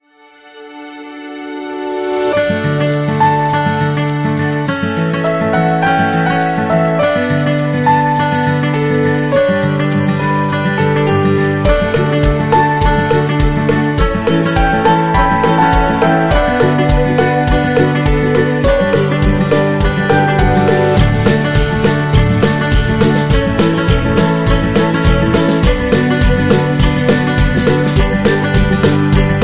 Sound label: Upbeat Corporate; corporate music vol 8